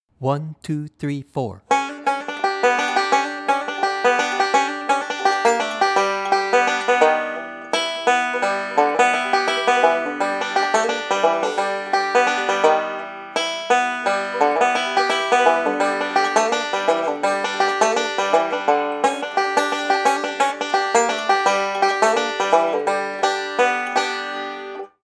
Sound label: Banjo; Jack Tuttle’s Bluegrass Banjo Primer; classic Scruggs piece as he played it his first time through the tune (1948 solo)